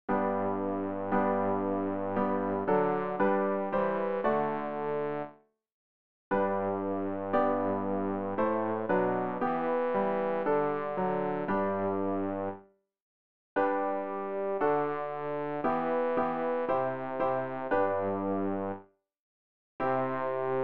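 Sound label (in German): bass, Übehilfen; Ref. Gesangbuch; RG 090: Danket Gott, denn er ist gut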